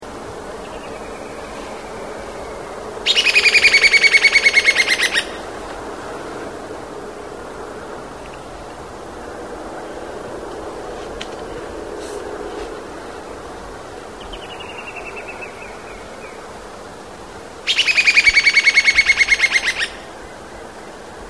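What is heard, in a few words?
Trepador Colorado, Dendrocolaptes picumnus, Black-banded Woodcreeper; cantos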